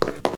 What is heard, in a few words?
steps stairs